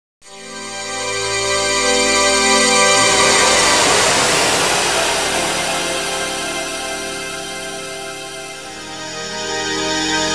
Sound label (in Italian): audio suonerie musica mistica